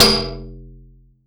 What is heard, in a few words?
METAL; Masterbits - Total Zone